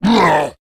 Creatures Fight Damage; Mighty Party; Android

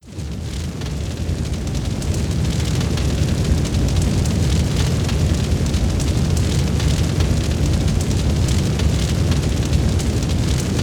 Fire; the masquerade lullaby

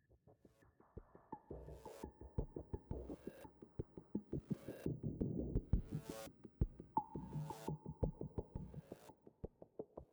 Basic Low Beat 85bpm

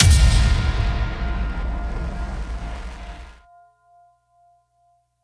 Intro Hit; Streets of Rogue

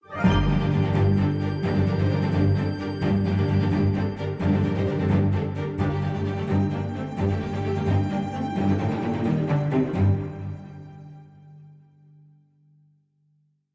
level end; Shadowhand; macos windows gamerip 2017

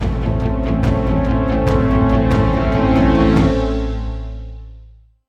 Sting Challenge Fail

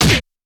Kung Fu Fight Sounds; Kung Fu Fight Sounds Pack

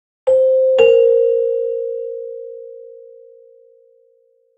Marimba

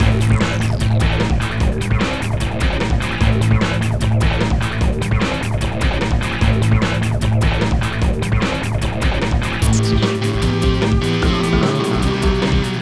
Hybridloop; Robot Arena

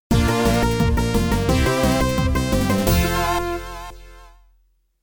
Logo; Pixel Painters

